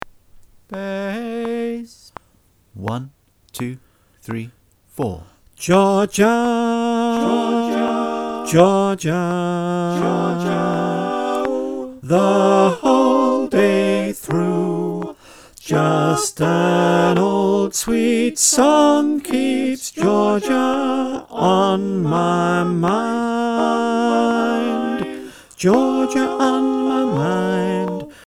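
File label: bass click track, choir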